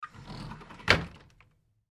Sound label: Pantry Door Close, Pantry Door Close Slide Shut Track; Cabinet Doors; Household; Blastwave